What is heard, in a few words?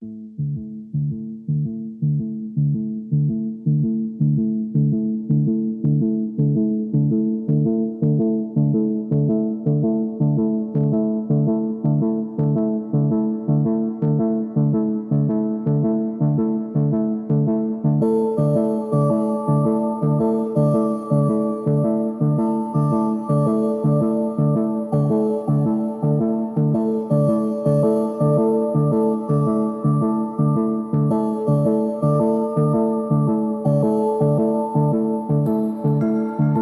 Order, Hook